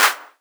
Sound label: SNARE, dubstep; DRUM HITS; Loopmasters; The New Sound Of Dubstep